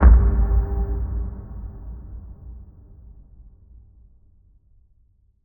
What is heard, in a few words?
Explosion